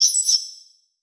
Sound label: Title Transition Data Transmission Blurp; Production Element Title Transition